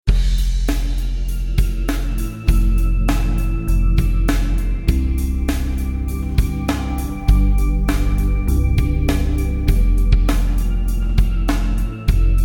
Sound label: F 100 rock ballad groove